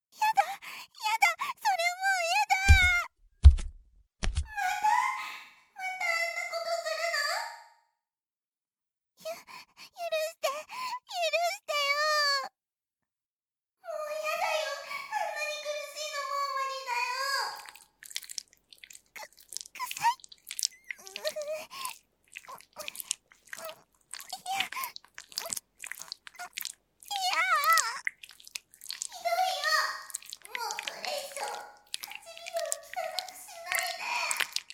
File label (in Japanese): キス